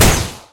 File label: laserpistol; Weapons; EK modern; Barotrauma